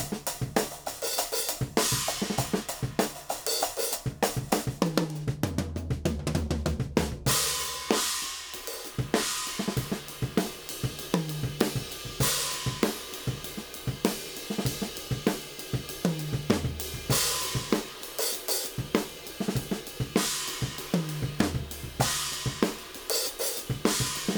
OH

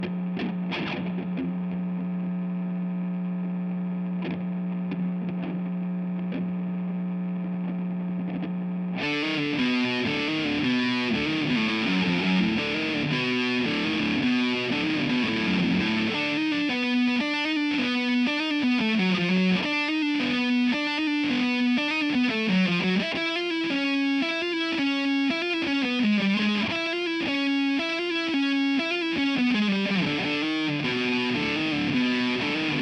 GTR; GTR RE20

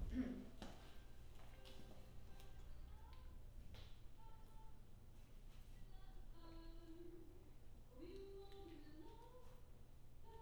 Choir Mid

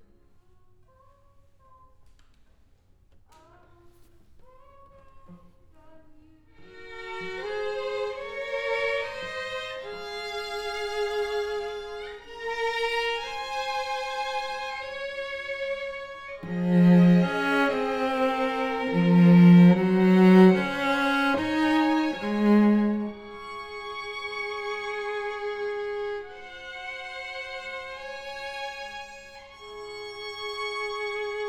Cello

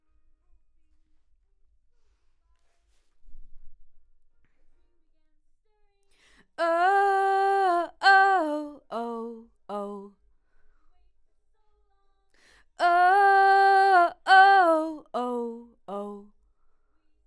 Vox start new

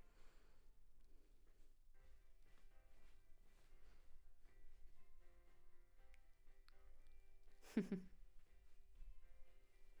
Vox

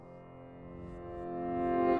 PNO Room RVRS

